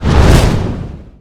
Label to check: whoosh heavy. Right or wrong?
Right